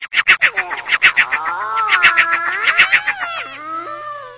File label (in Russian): hihikane zhivotnogo